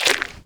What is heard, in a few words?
fs fm paint, footsteps; Portal 2